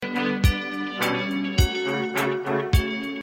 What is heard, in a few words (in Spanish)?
Campanas